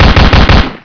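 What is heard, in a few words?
machine gun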